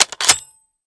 bolt open, Guns

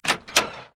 door open, Vehicles

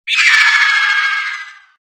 shriek, Vox; Voice

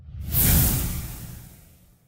teleport departure

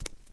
wood barefoot, Footsteps

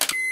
microwave start beep

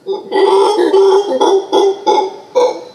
monkey; Diseases

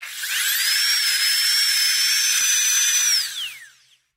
saw, surgery; Medical

